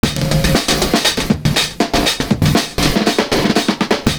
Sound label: ANOTHER FILL, LOOP